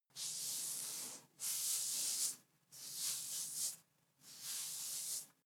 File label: hair short hard comb sequence; berufe; friseur